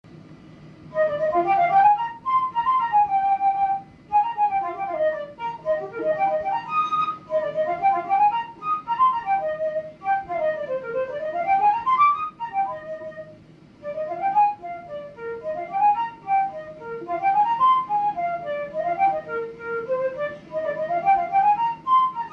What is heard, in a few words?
Flute Technical Etude